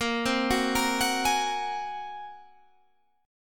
A sharp 7th Suspended 2nd Sharp 5th, Guitar Standard chord; arpeggiated; 18,15,16,15,19,16; JGuitar